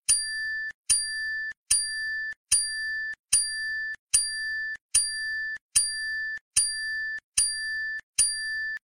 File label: One Ding iPhone